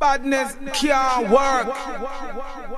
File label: breakcore